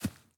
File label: grass, walking; factorio 2.0.55